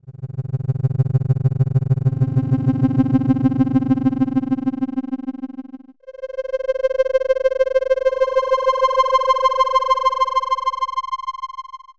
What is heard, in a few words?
Sine Creeper; Retro Noise 3 Instruments